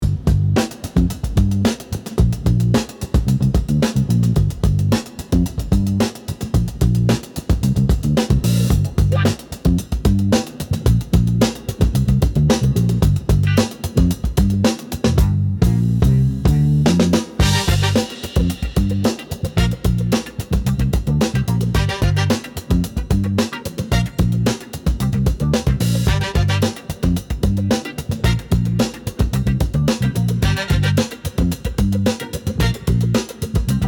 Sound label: Funky groove happy good vibes only